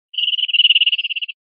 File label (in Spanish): absorbidos, verderon